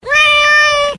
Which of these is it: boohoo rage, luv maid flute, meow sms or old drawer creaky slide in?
meow sms